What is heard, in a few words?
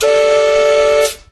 street whistle, horns